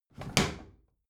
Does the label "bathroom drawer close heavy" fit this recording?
Yes